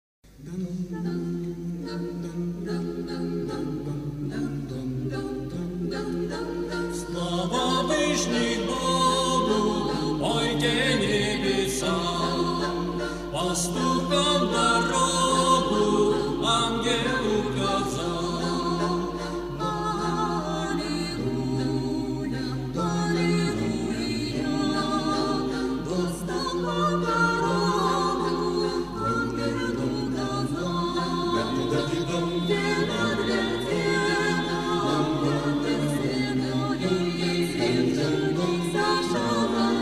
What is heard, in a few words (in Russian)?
francuzskaya kolyadka; hor boroveckoy cerkvi